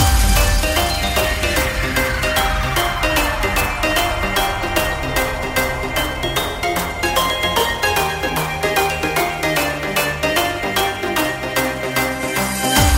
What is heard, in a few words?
Tetris hardstyle